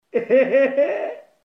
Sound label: hehehe; 21st and dank memes; phx new taunts pack 2